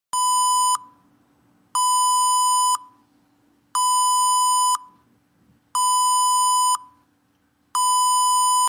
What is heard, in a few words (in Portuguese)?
Pi não continuo